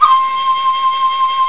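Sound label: shakuhachi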